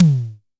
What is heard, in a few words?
Tom, Vel Mid, Tama TS-206